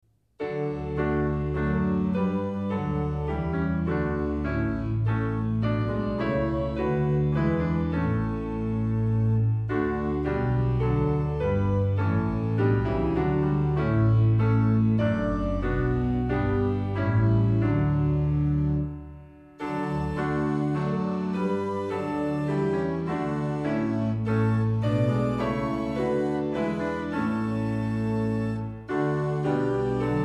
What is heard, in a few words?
SDA Hymnal Song Tune